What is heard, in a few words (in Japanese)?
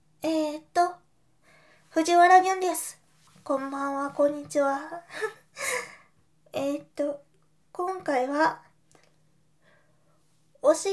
おしっこ トイレ ステレオ